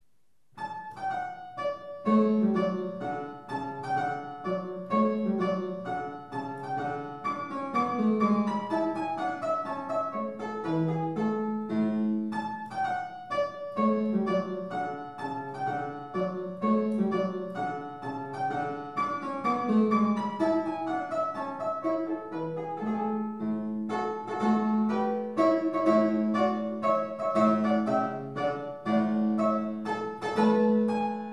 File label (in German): Hammerfluegel; mozart